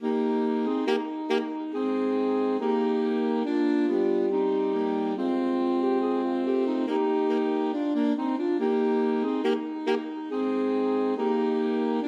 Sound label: haydn, alto sax quartet